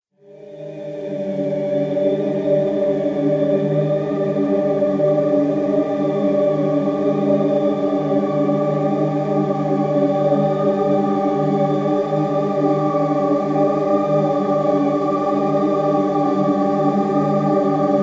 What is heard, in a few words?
Demonic Transition